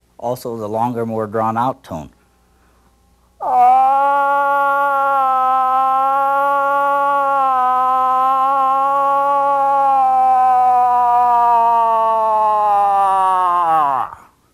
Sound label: cow call long drawn out, moose